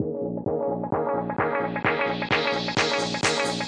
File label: climby house filter